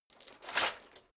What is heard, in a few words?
metal chain movement on plate single